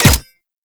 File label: Energy Rifle Fire; Energy Rifle Vandal; Weapons